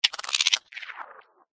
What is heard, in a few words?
science fiction steampunk glitch